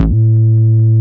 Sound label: Bass Root A